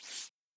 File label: Rip Up Paper